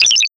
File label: Metals Kick